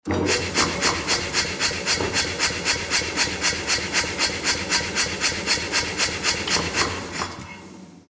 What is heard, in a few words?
machine